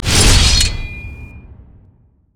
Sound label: dragon strike; LoL; jarvaniv